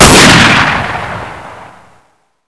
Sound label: shoot, weapons